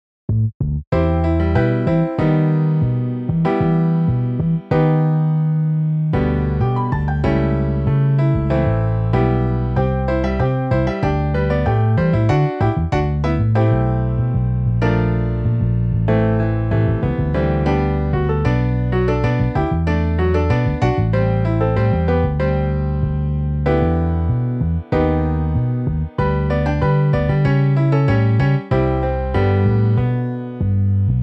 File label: Piano Bass